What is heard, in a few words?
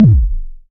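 Short BD, dry kicks; Quasimidi Rave-o-lution 309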